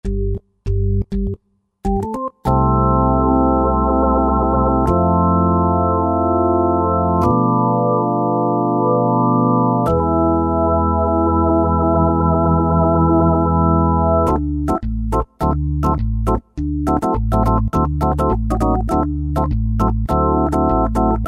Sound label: Choir Organ, Roland JD-990; Factory Patches